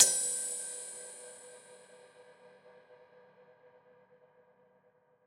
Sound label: Ride